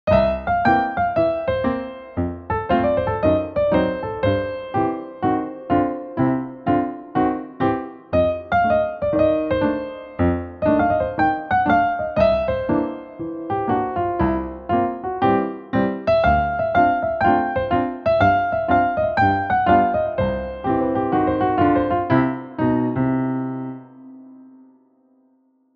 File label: Happy blues